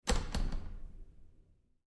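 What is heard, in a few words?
Door Bathroom Stall Handling Close Up Lite Short; BigRoomSound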